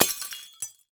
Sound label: Break Window